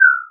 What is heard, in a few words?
ethereal chirp